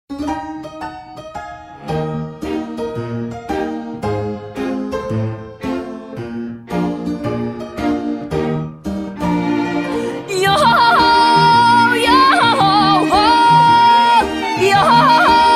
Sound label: Yo hohoho Song; One Piece; Brooks